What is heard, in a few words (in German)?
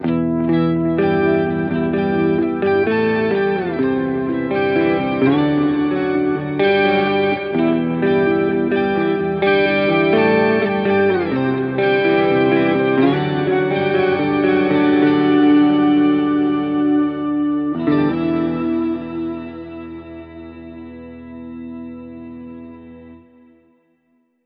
Clean mit Effekten; Walrus Audio Luminary Demo